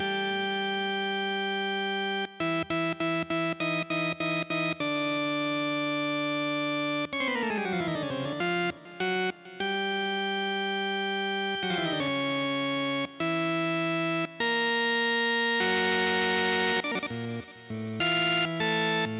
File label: Danger Loop; Draw a Stickman Epic 2